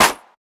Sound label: clap; foxdot default